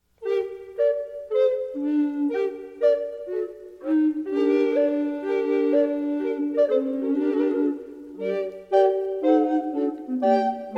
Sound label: Noels SATB, recorder